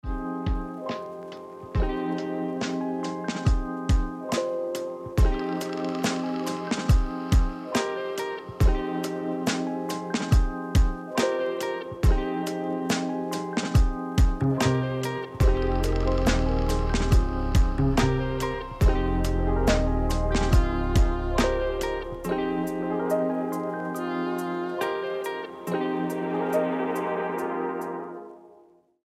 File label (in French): musique posée